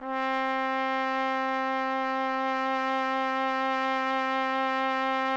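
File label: TPT, trumpet; Roland - LA Composer vol. 3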